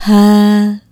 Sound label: "Hah" G#2, Swingin' Single Notes